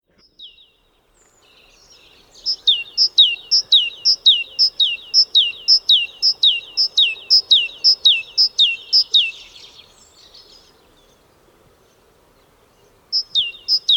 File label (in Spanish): Carbonero común, Parus major; ocells